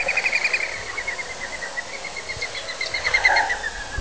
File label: dove wing